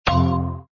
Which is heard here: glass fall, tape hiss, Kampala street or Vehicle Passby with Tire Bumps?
glass fall